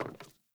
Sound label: Sandals Walk Wood; Characters; Player